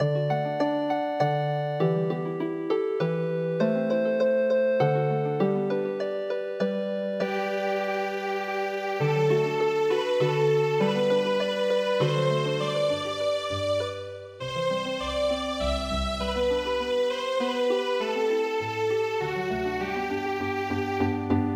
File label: home town Healing Harp